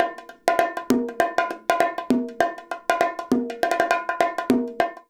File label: BONG, BONGO 100, Modern Percussion Loops